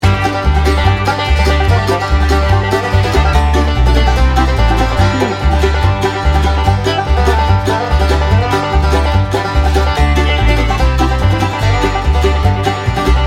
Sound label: bluegrass loop